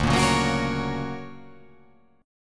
D Augmented Major 7th down, guitar

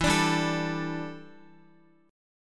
E Minor 11th guitar chord; Em11 strummed; JGuitar

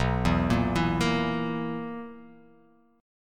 B Major 7th Suspended 4th B down

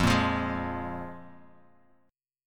F Minor Double Flat 5th, F; x,8,8,10,8,x,x,x; down; chord shape

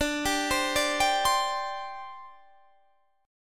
D 7th Suspended 4th, down

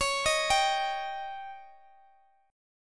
Db Suspended 2nd Flat 5th, Guitar, Half Step Down

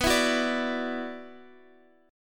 Gb 7th Suspended 4th Sharp 5th B

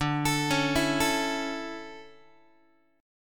D Major 7th Suspended 2nd, Guitar

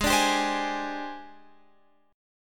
G sharp Major 7th Flat 5th, Guitar, Open F; strummed; x,15,14,14,15,15; G#M7b5

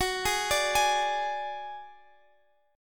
F sharp Suspended 2nd Sharp 5th, guitar; chordshape; down

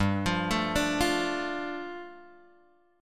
G 7th Suspended 2nd G down Guitar Open G

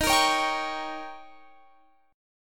D sharp 7th D sharp, Guitar Half Step Down

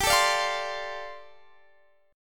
G 7th Suspended 2nd Suspended 4th, Guitar Open D

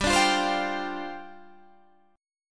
C Suspended 2nd Suspended 4th, G

